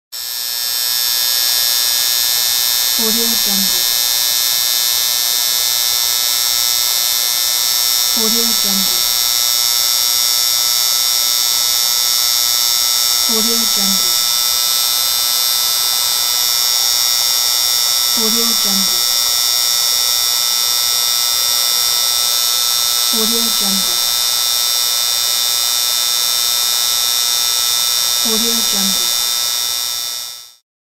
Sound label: neon lamp; almira23